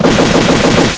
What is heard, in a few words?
thinking; evil manfred